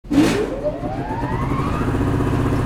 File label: shelter generator start; Original TK Sounds